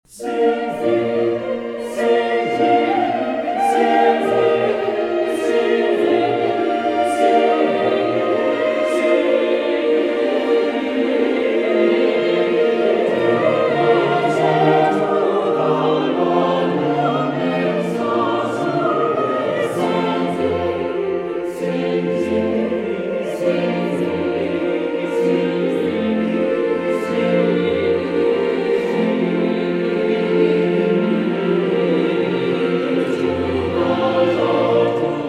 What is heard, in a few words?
Sing to the Lord English